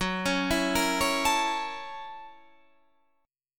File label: F sharp 7th Sharp 9th